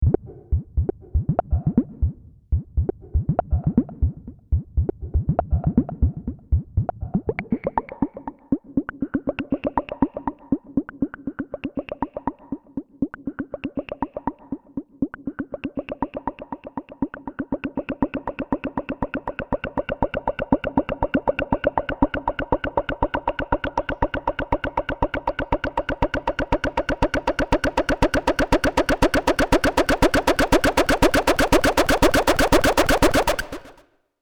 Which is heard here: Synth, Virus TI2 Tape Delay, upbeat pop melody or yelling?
Virus TI2 Tape Delay